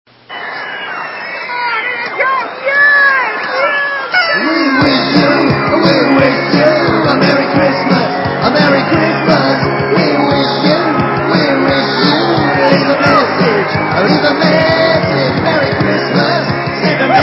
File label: Rock N Roll Xmas; ChristmasMessages Australia